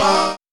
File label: baah; Truth-Hz - 1-Hit KO Sample Pack